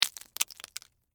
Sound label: Texture, Debris, Waffle, Cones; Materials